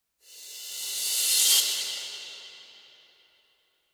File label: Main Menu To Battle Transition; Pokémon Trading Card Game Live